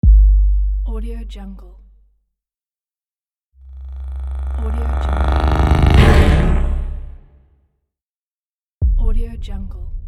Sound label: transitions pack